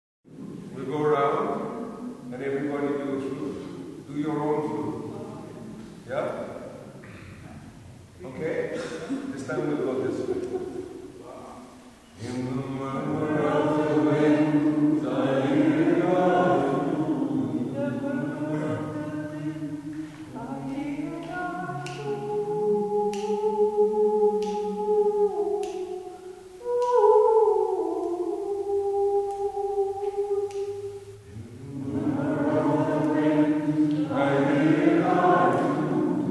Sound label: The flute and the waves